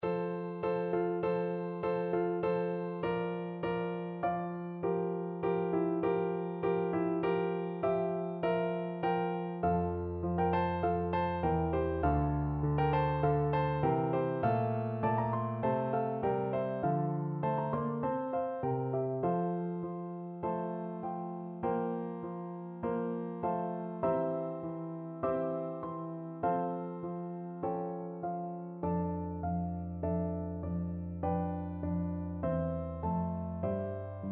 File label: piano, piano duet